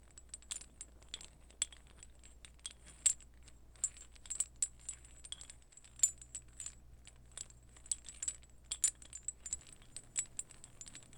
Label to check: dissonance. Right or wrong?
Wrong